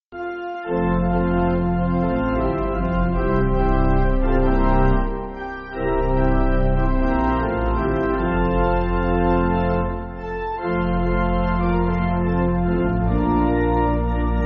Pipe, church music

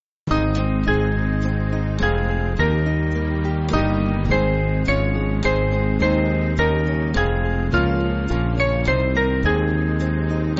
Band, church music; What Shall I Do; Jerusalem

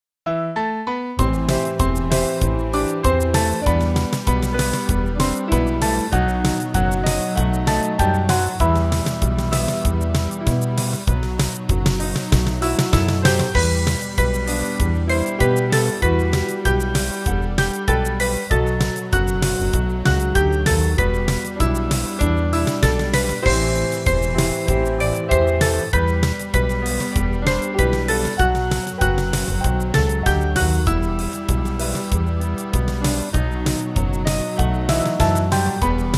Slow

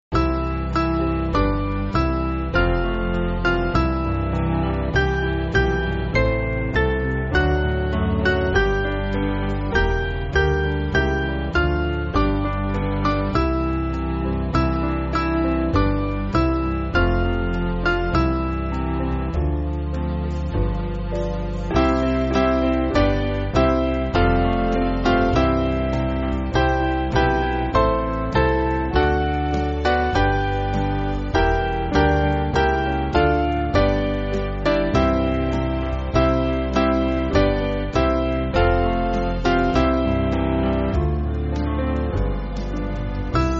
Band, church music; When Our Heads; Redhead 47

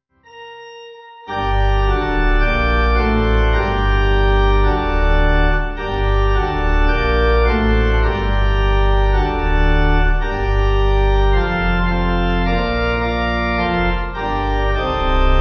Russian Trisagion Pipe

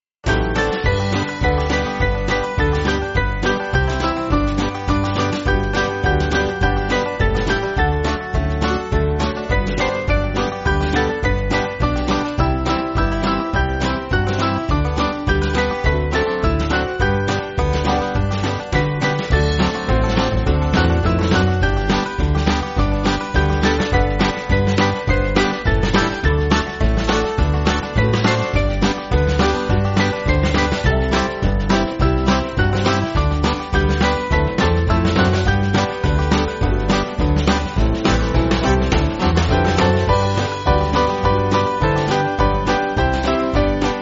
Band, church music; When My Life Work; Sweney

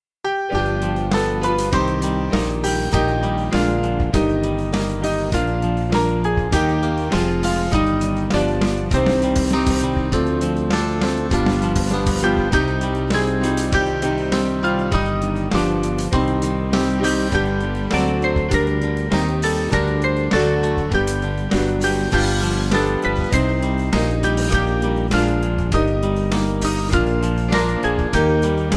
Sing Now With Joy, Band; Adon Olam

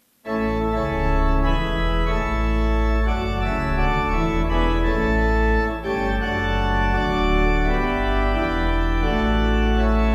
I Know That My Truro Vocal Organ

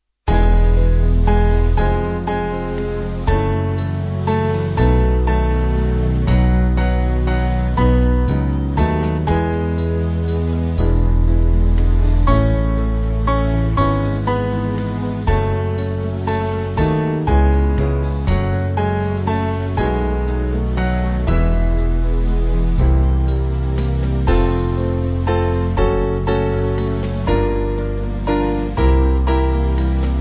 Band

